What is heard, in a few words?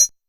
CR78 Beat